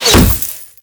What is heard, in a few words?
Glass Unbreakable Impact